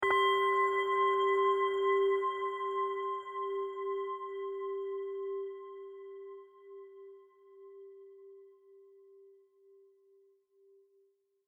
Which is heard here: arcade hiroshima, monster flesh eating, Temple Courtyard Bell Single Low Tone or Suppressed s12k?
Temple Courtyard Bell Single Low Tone